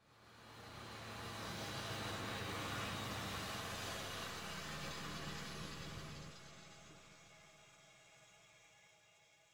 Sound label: Aircrafts A-10 APU End; DCS